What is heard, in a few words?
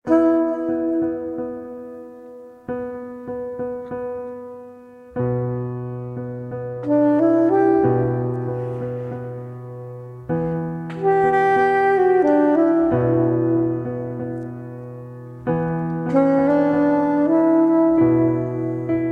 Radio Music; serious sam siberian mayhem 2022 pc gamerip